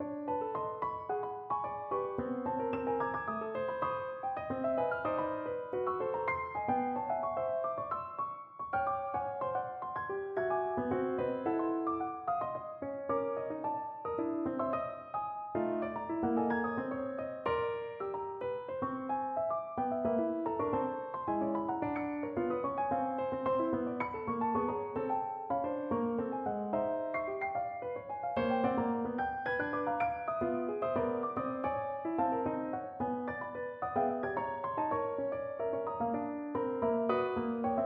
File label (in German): klavier miniaturen